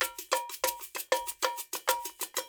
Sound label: 96, Percussionworks loops; Sampleheads; GJ LOOPS 96